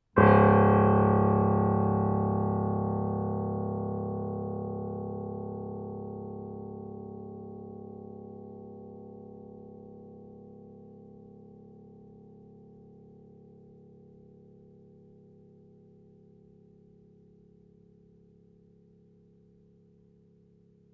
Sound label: Multiphonics of the grand piano, flageolets